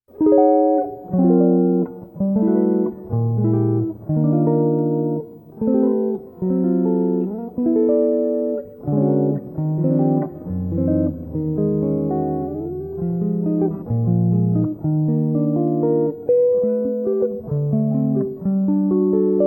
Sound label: Sample Exotic Chord Melody; Warner Bros Publications